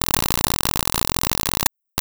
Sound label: Rhythm